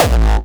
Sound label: Tritone Kick